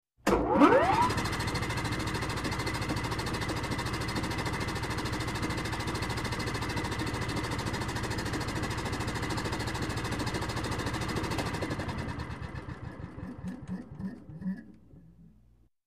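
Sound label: Compressor Motor Tool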